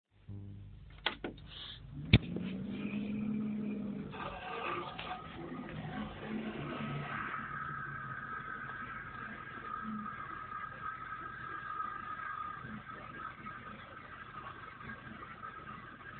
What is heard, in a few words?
damaged hdd; Broken NAS drive